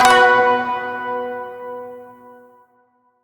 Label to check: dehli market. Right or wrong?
Wrong